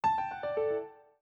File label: Hardware Remove